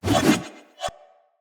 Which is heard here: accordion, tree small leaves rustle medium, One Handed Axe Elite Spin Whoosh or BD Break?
One Handed Axe Elite Spin Whoosh